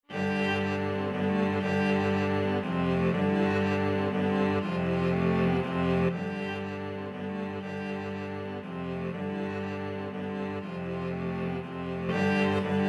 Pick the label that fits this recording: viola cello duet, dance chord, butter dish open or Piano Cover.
viola cello duet